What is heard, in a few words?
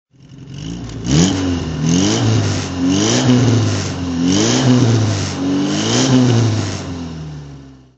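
triumph tr4a throttle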